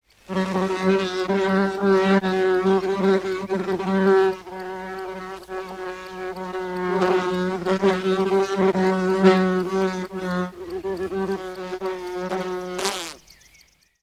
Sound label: the sound of a flying bee